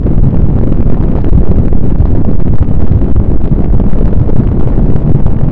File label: Snow Boulder Roll; Blastmorph Pinball; xbox series xs